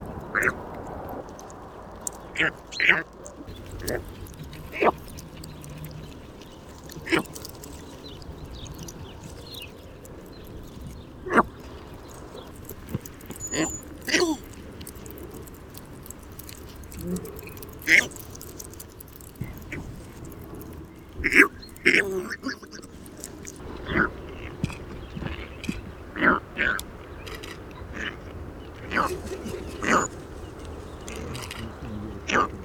eremitibis

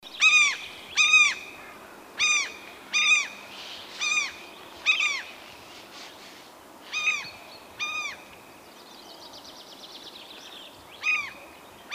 Fiskmas

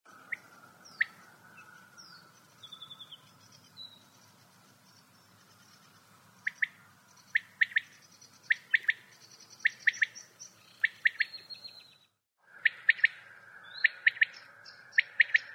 Vaktel

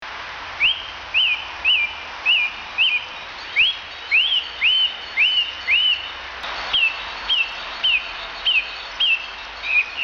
Taltrast

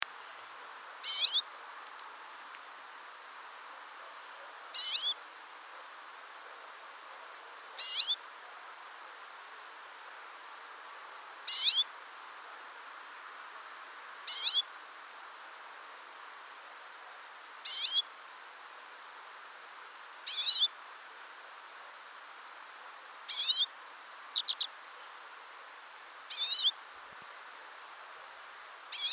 Grasiska

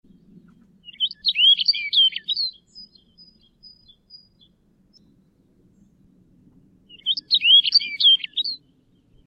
Videsparv

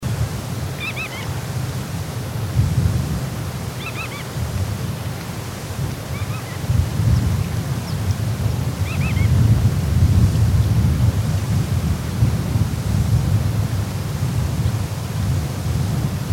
Kustsnappa

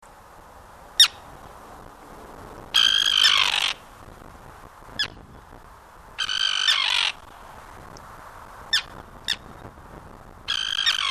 Silvertarna

